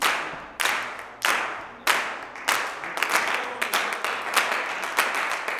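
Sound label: CLAPS 96, Gospel; Blues & Soul; G.CLAPS 96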